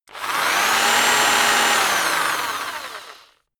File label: rock drill rev low long; bohrer; soundpool